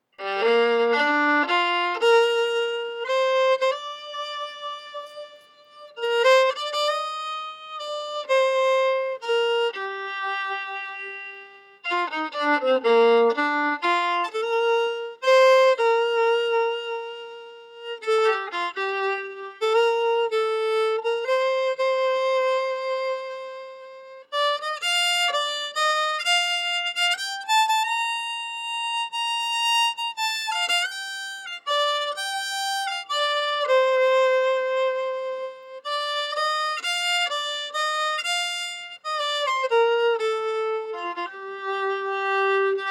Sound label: Waltz